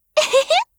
weapget, ump9

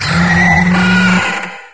hydra pain; scmod

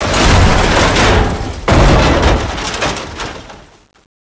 barrel crash

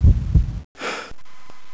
heartbeat

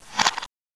weapons MP5, clip out

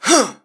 Swing; doctor

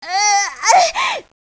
loop, weapons

